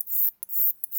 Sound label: song, katydids neduba; neduba prorocantans